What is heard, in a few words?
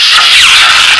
Impact